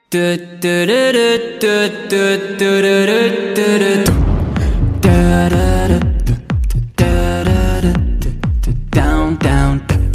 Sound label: Duhduhduhduh